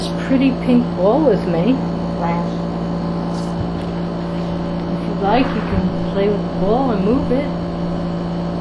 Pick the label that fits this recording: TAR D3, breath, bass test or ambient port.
breath